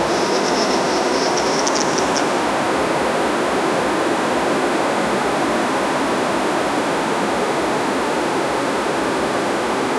aleteo de una paloma, bird

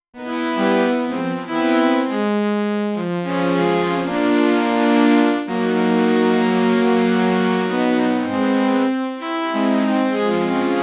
una secuencia ascendente

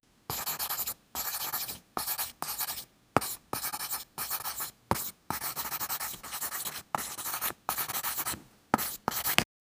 sonido cerrado de un lapi, writing

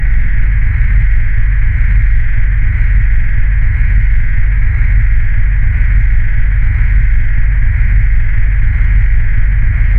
el ruido de una, transport

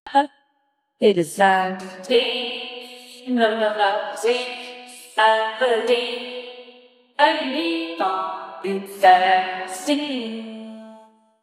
crea una intro musical, expressive

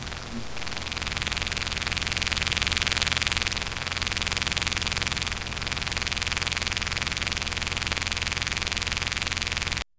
cámara de vigilancia movi, security

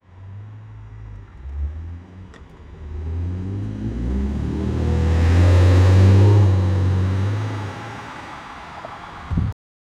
un viejo auto destartalad

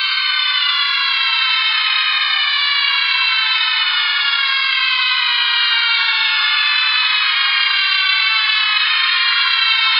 particulas de magia verde, butterfly

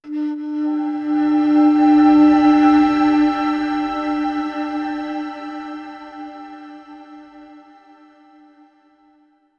la flauta debe generar un, melody

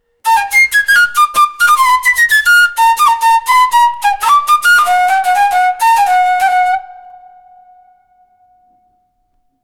flauta sola el ritmo de, flute

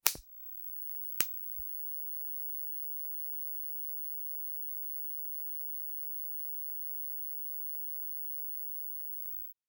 zumbido de luz LED

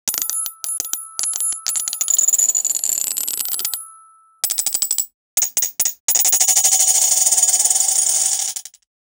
efecto de sonido corto, collect

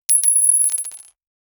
efecto de sonido corto, reward

